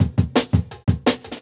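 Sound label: Jungle Breaks, 169 bpm; Fel; Original Jungle Breaks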